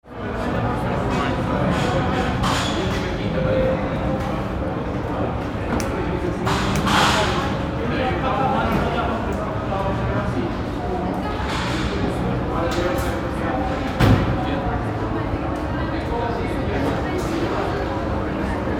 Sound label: airport hall